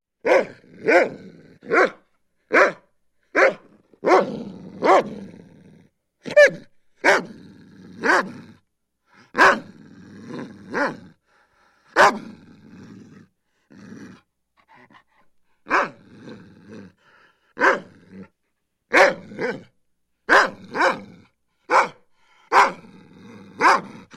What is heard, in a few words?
Large Dog Barks & Growls; Birds And Animals